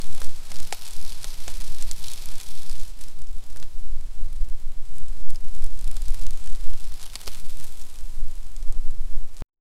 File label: singular leaves; pumpkin